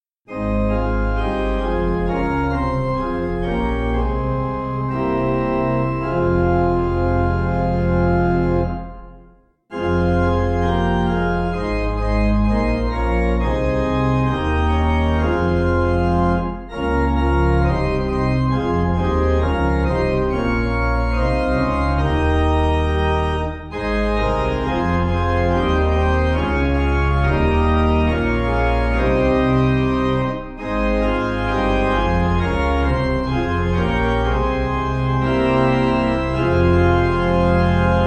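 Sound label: Pray Fervently, Pipe Organ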